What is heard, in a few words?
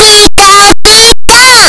pikachu cry; pokeyellow